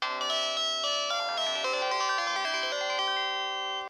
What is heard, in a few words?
Startup